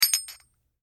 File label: Metal Impact Foley Small Bounce, Metal Impact Foley Small Metallic Bounce Household; Materials; BBFX